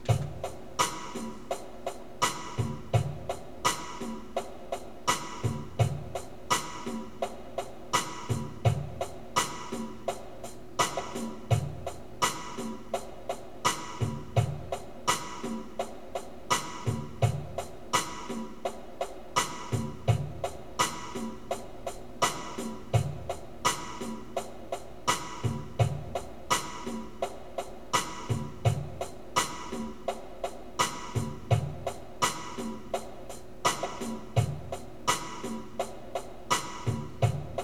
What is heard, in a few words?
drum loop